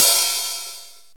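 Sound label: Ambient Crash C# Key, crash tuned to the C# note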